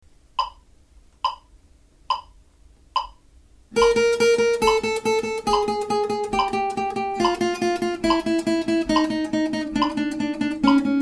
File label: speed slow